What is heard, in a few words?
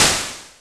PER PROCU, Procussion